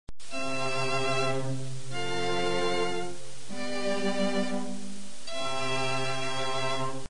progressao harmonica; musica classica

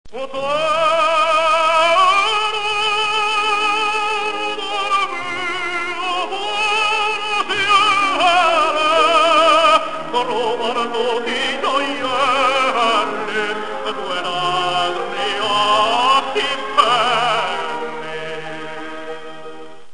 acordes; musica classica

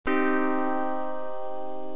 acorde menor; musica classica

